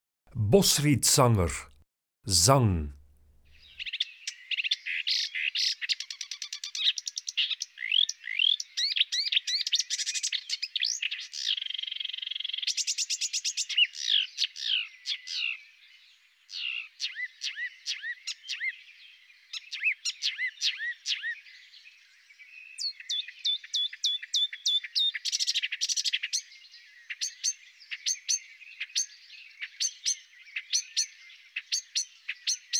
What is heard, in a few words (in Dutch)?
Bosrietzanger, Zangvogels; IVN